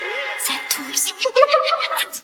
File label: ahahahah